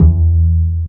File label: Plucky E, Basses; Total Drum&Bass